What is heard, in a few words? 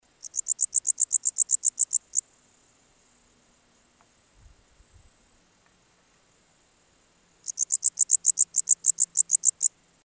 Pholidoptera aptera goidanichi; BIOAC ORTH